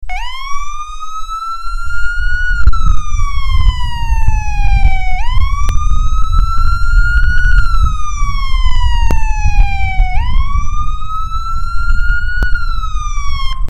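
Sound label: wail, siren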